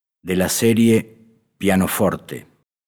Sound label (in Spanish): Pianoforte